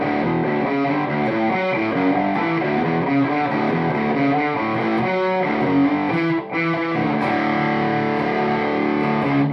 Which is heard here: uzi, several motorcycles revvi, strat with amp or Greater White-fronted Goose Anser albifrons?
strat with amp